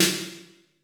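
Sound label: MIRROR Snare; Masterbits - Soniq Elements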